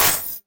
hit light; Sfx; freepixel; Tilter

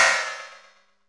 chains rattle; Horror

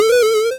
save game, ui menu; freepixel

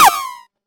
bow release; Character Actions

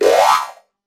swinging blade; Traps & Hazards; freepixel

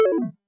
cancel, ui menu; freepixel

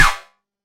sword slash; Character Actions

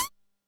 piece move; Cards & Board

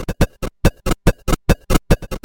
footstep metal; freepixel; Footsteps

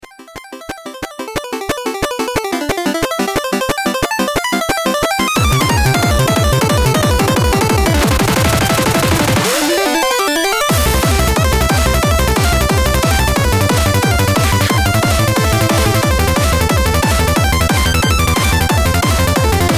rage fury, music; emotional

